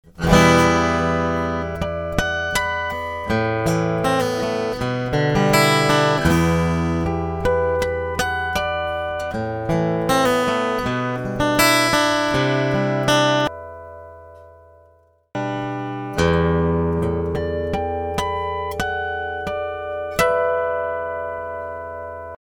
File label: Flageolet